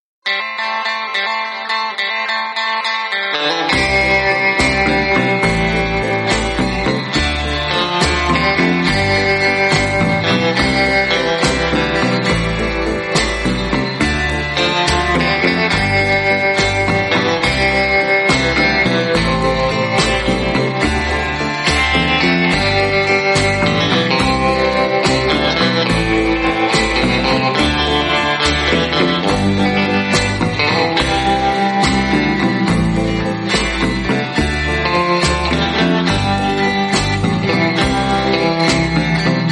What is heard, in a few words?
Instrumental